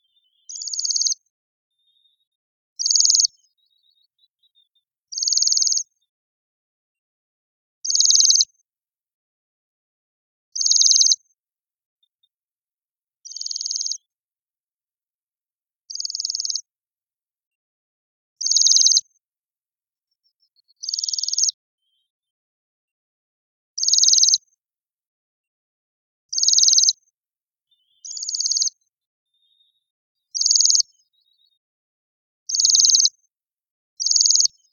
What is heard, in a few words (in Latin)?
Bombycilla garrulus